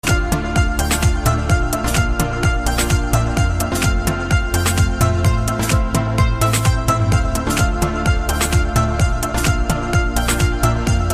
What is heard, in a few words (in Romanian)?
muzica